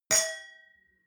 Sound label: wine glass different clink bounce dull hard, gastronomie; berufe; soundpool